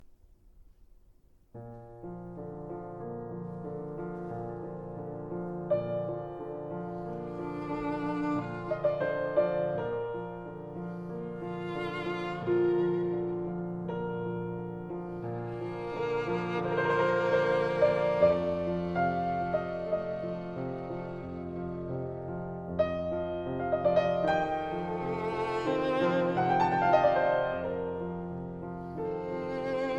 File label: Violin Sonata in F Major, Adagio molto espressivo, piano and violin; Beethoven Sonatas for Piano and Violin